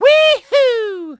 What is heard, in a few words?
jumpspin "weehoo"; Mario; Super Mario Sunshine